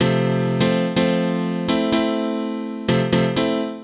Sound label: House Piano (125BPM), retro house